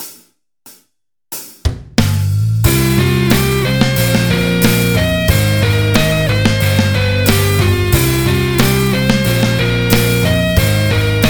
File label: rock